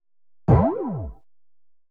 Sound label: HOUSE FX 125